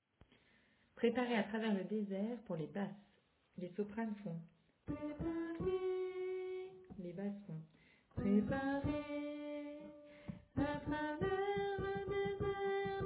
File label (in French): Basse